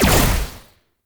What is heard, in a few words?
Amp Silenced Shot; Operators; Amplifiers; Drifter; Warframe